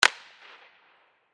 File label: Hockey Stick Hit, Hockey Stick Hit Puck; Shot; Sports; SoundEffects-Blastwave